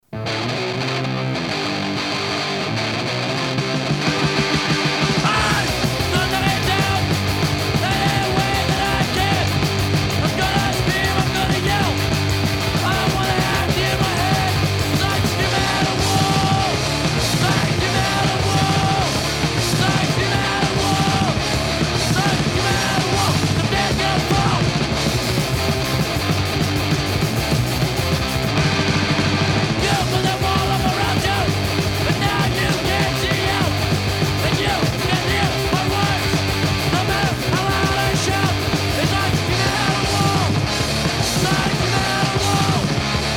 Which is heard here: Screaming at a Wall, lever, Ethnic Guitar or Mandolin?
Screaming at a Wall